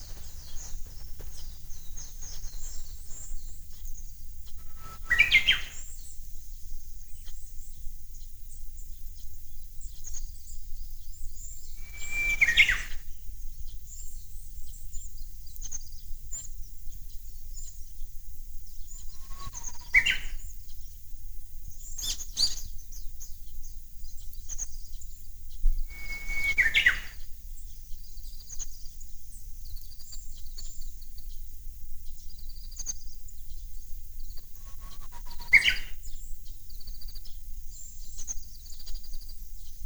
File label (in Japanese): ウグイス音声; ９０秒